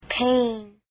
pain

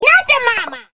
baby dinosaur

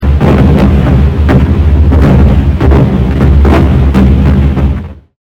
explosion

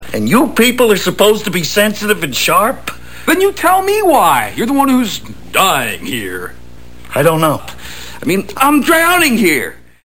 water

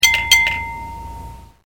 gas bell

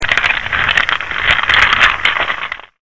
CRACKLE

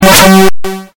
Squeze

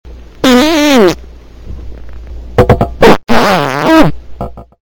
fart

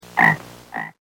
bullfrog

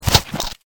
pick up gun

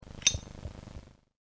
zippo

